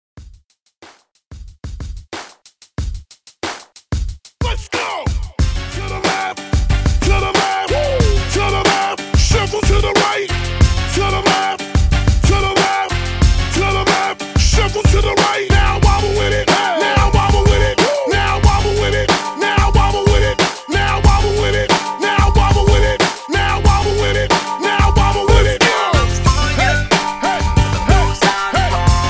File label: 90S PARTY BREAK 92 BPM